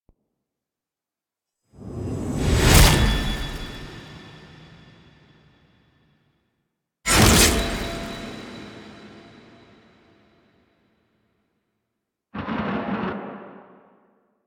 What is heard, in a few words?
Sword Stab; Cinematics; Ballas; Warframe; Duviri Quest; Courtyard